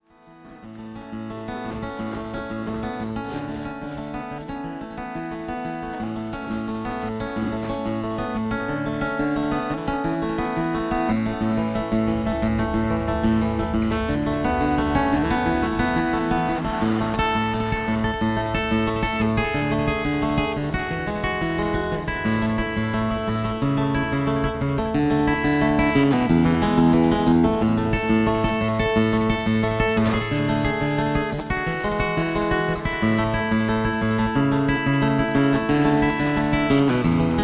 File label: Instrumental